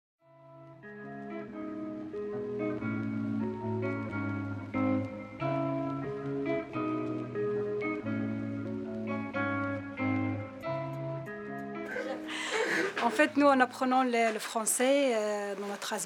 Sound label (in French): slam parle studio son seul